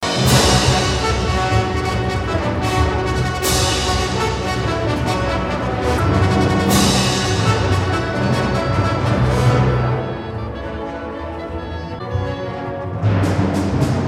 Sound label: Symphony Orchestra; Moscow